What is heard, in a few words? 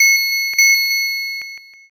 bike bell